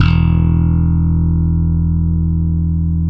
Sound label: SLAP BASS, Electric Basses; PlatinumPhattCD2; E-MU Producer Series Vol. 8 – Platinum Phatt